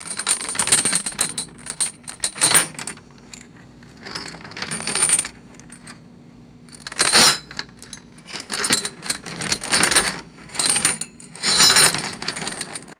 heavy metal chain rattling and clattering; chains

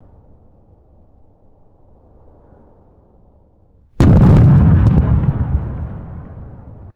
distant firework explosion and echo

